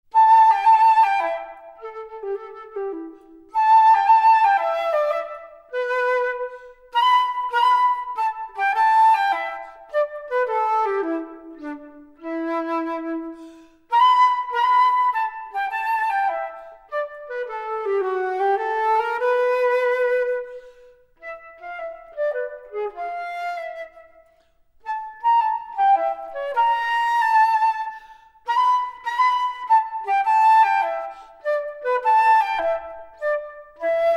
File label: jazz waltz blues